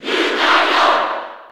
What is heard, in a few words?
Cheer; Lucario; SSB4